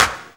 CLAP, Dance Drums

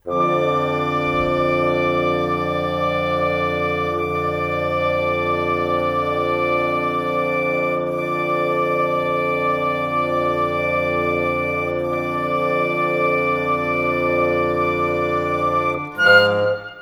Bassoon, Flute Oboe; Sonic Foundry (Sony Creative Software); Orchestral Series VOL-3; Cinematic 27

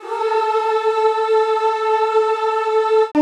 CHO A3; Sound & Vision; Gigapack I CD 2; CHO Zzzz + Vvvv; CHO Vvvvv

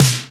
Roland R-8 Snare, Drums

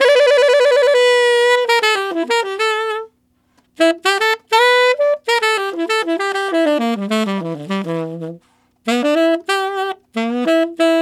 Tenor Rock in E7, Tenor Sax Loops, Jazz; Sonic Foundry (Sony Creative Software); Jazz Solos and Sections

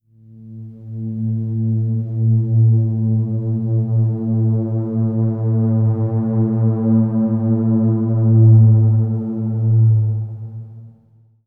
Drone A, Ambient; Drone 2; Trance Formation

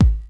DR. LOOP; X-Static Goldmine 3

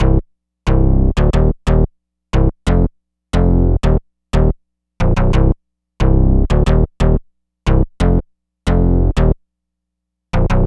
Purple Bass; Basses; Dr. Fink's Funk Factory

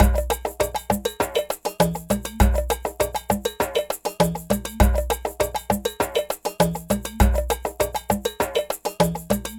Ensemble, Latin Percussion

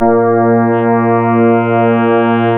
ORGAN, A#; Big Fish Audio; Synclav FM

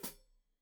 Hat Foot, Hi-Hat, Cymbals Stereo

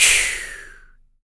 CYMBAL, MALE PERC; Spectrasonics Vocal Planet; Jazz FX

